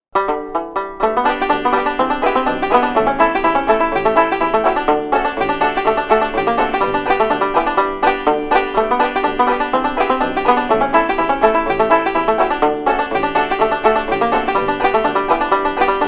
plectrum banjo

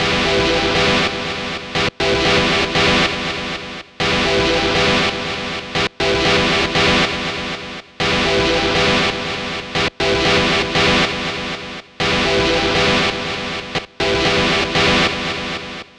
arp loop e 120 bpm

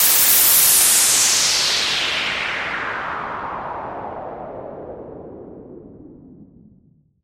club impacts sound

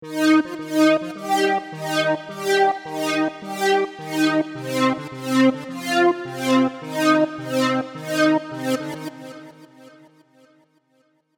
serum synths; chiqui audio

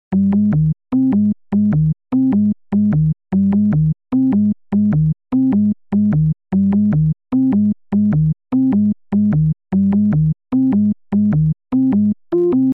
minimoog sub-bas; bassesland

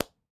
hand touch contact foley

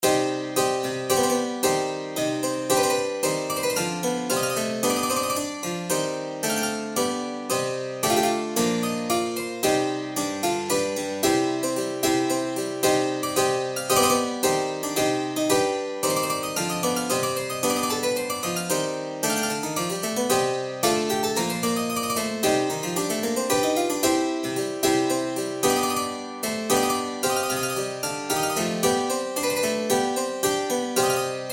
italian harpsichord

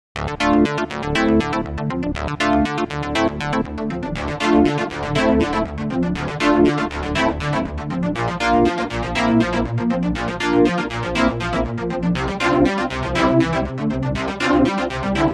varying chorus attack

